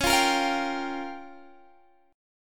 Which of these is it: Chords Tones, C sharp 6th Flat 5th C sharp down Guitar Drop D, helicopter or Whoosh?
C sharp 6th Flat 5th C sharp down Guitar Drop D